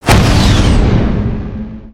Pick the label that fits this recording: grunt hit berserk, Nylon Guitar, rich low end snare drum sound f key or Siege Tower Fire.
Siege Tower Fire